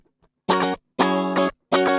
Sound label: GUI 120 5bF#; Best Service Gigapack 2